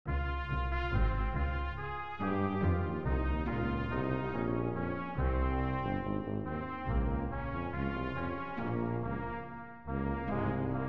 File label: melan klezmer